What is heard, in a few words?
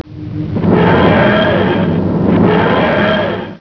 TARDIS; Dr Who